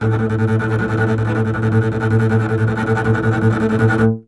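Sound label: string contrabass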